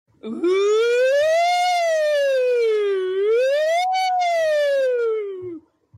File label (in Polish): syrena